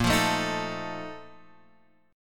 A sharp Major 7th Suspended 4th, down, Guitar, Open F; strummed; JGuitar